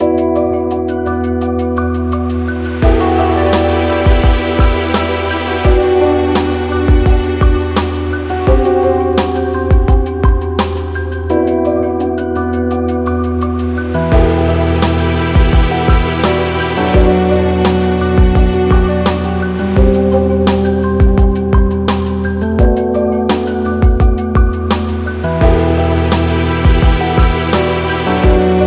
tension, horror excitement thriller song; By Burak Sound Bank

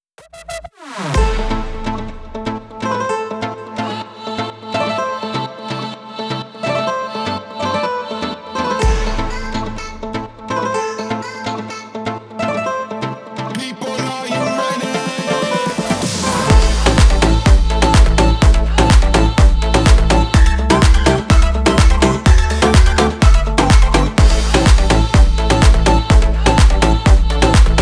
house electronic techno; By Burak Sound Bank

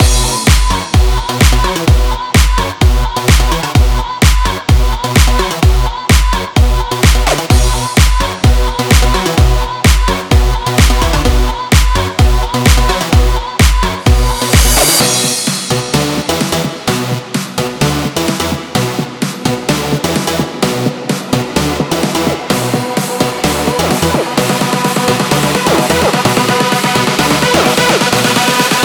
house electronic techno; By Burak Sound Bank; house electronic techno vol 7

